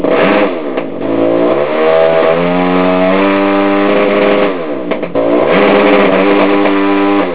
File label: New Rev Box G#